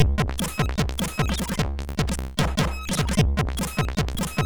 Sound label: Break, MOS6581R3